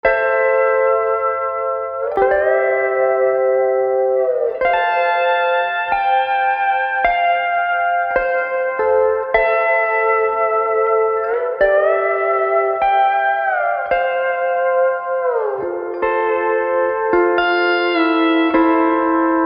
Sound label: Master Room MR-III - Pedal Steel; Nevo Studio Springs